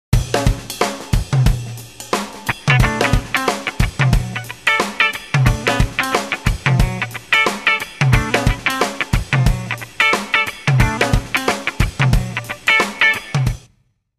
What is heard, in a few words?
Rhythm Guitar